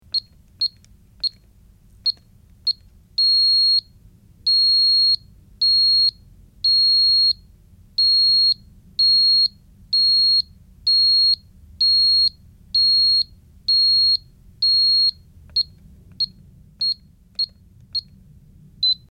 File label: Alarm Code Beeps Rep; hi-tech various